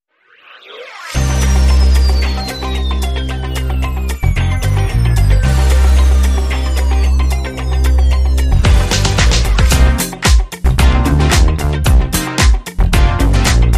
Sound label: Funk